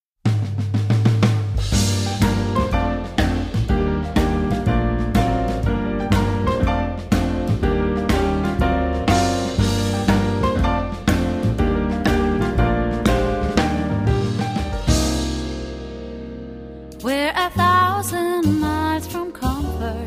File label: Pop 2 Jazz